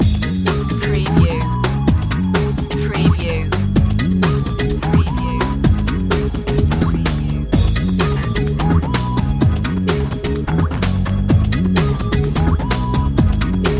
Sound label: funk loop